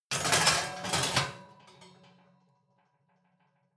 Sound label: oven baking sheet draw fumble